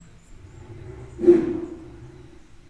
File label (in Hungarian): házból üvöltés, bengáli tigris; miskolci zoo 2009